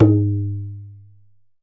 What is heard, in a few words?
tabla